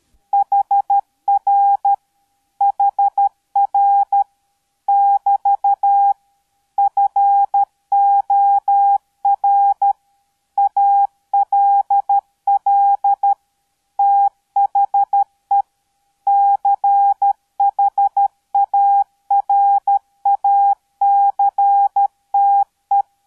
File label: MORSE